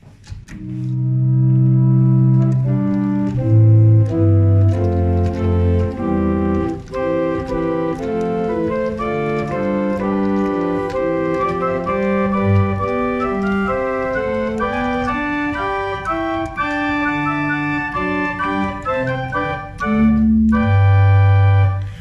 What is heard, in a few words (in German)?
Englisches Positiv, pipe organ